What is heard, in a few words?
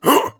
attack receive